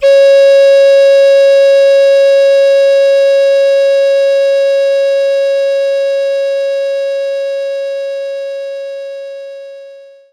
Recorder Cd5

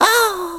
surprised; Scrat

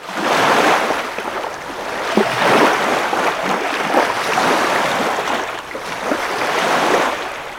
Waves

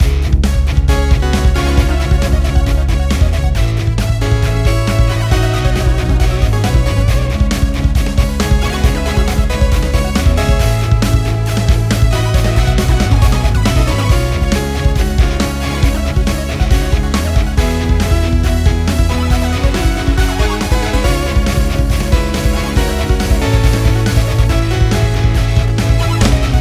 Classic Bgm Prog Tempo Full; Timberman VS; ps4

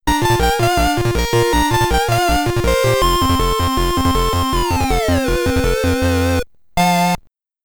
Stage Clear (SID Stereo); skateboard joust commodore 64

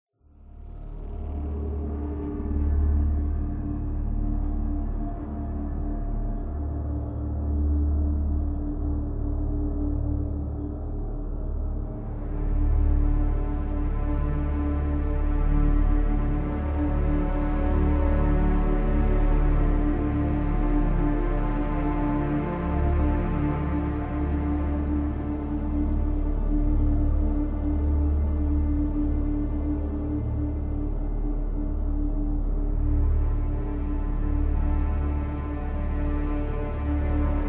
Tension Strings

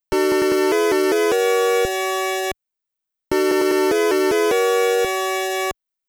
Fanfare; The Three Stooges; NES

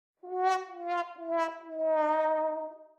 Jingle system Camera Broken

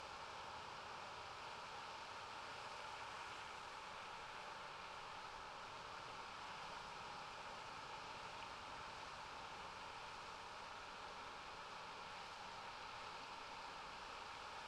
Bgm Amb; Hokaku Bgm Amb1

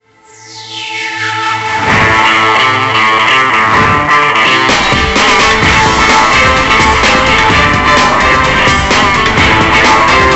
Theme Riff; Deal or No Deal Special Edition; NDS

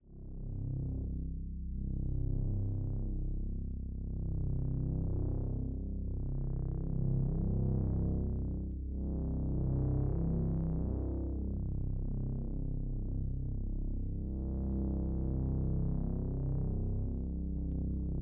Drone; Get Even

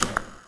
Button

